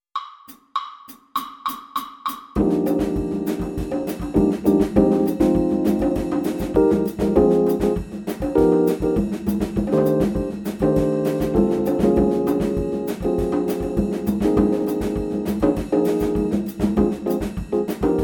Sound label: Bass Samba Play a Long, Jazz; All-State